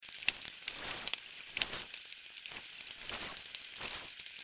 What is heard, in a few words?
SW, dolphins signatures; Luna